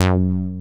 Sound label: BASEMENT BASS; Masterbits; Dance Trax